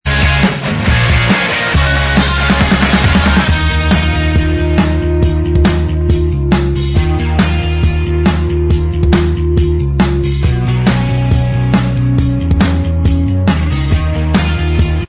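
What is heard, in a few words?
instrumental, backing track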